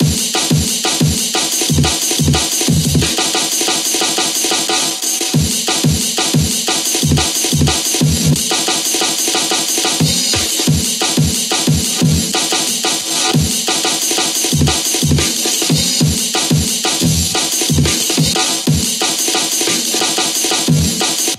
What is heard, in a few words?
Heavy Amen Break Loop 180 Bpm